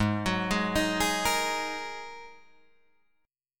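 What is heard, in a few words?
A flat 7th Suspended 2nd A flat Guitar Drop D, chord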